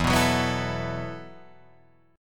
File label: E Suspended 2nd Suspended 4th E, down, Guitar, Drop D